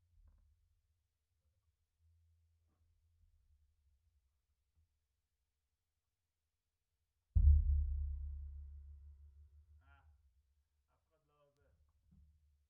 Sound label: Sub